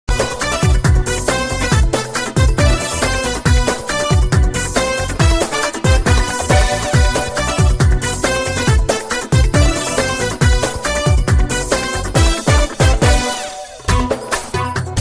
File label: arabic super